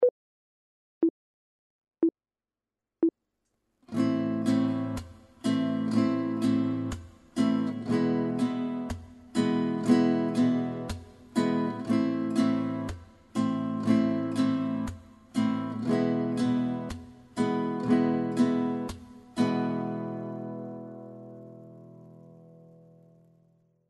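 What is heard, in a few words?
chord, guitar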